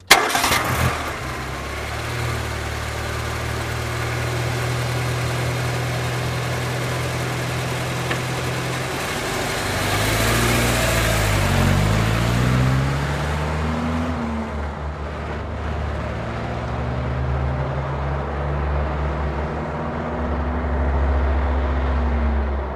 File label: Jeep Cherokee 84 Strt Out